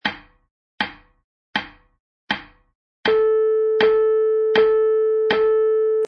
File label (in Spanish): negras, piano midi; ejecutadas con un piano midi; cada figura por separado